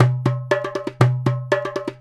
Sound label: Tablah, Roland BK-5; Loops & Phrases